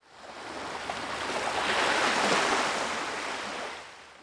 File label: WAVE, water; PC Gamer IT CD 40; zones